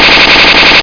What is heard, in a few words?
shots